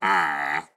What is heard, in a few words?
Blacksmith Action; Folk Hero